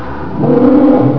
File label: garg attack